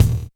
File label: good kickdrum a sharp key, kick tuned to the A# note. Loudest frequency: 630Hz; kick drum samples